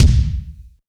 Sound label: bright kick drum single hit d key; Duration 0:01